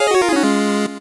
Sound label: 8bit se